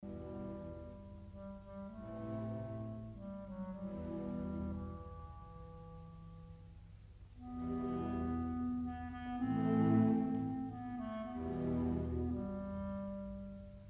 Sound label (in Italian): clarinetto solo; scuola di musica; strumenti